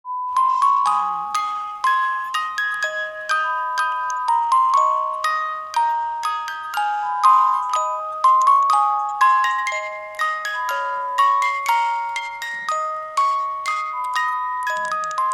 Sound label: music box sound